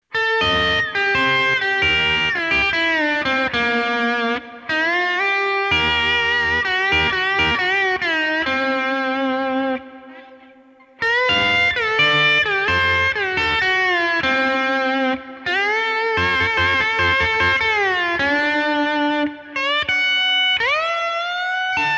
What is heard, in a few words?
Overdrive, AXE FX 3